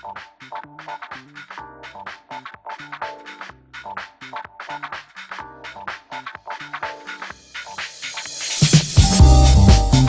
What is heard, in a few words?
deep house sax